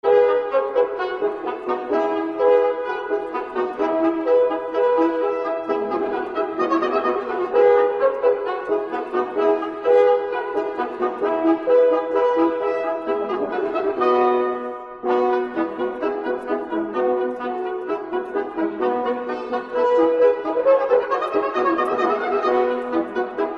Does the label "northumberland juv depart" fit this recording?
No